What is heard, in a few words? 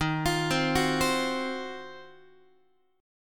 D sharp Minor 6th Add 9th D sharp Guitar, chord; arpeggiated; JGuitar